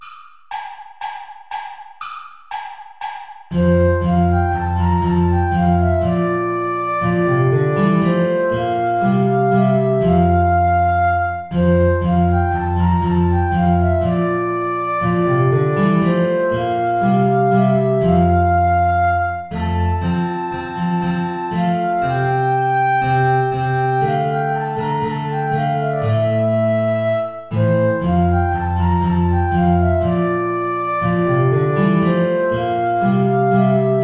mit lieb bin ich umfangen play along without guitar; steuerlein johann